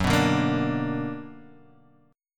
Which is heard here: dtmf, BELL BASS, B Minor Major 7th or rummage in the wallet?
B Minor Major 7th